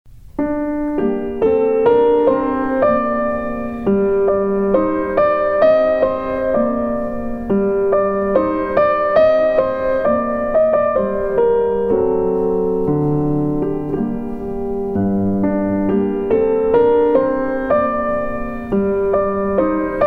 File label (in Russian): Старинная французская мелодия